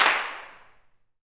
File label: CLAPS, Gospel; Spectrasonics; Vocal Planet Vol.1 Gospel; SNAP